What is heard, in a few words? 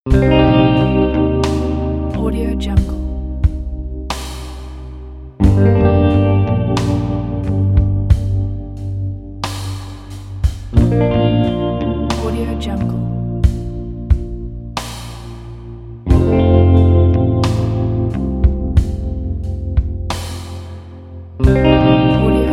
melancholy